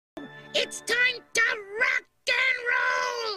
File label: RockNRoll; Toad